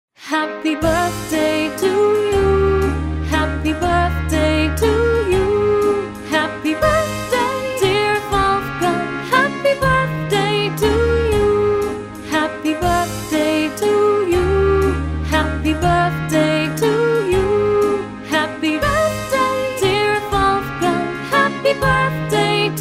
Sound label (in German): happy birthday wolfgang